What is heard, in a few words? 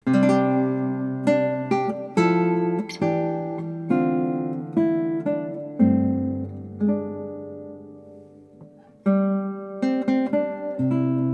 Guitar Recital; Gitaarboek